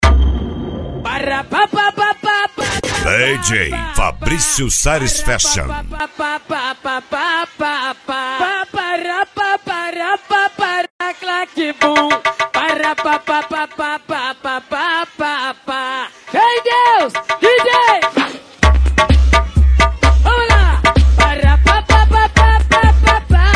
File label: funk